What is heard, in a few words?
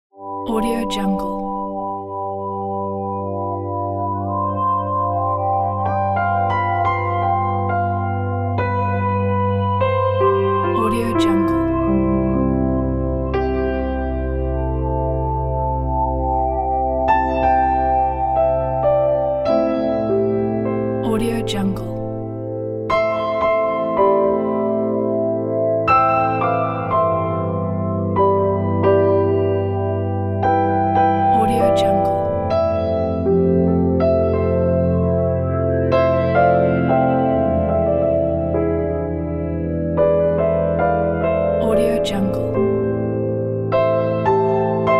relaxing music; calin-moraru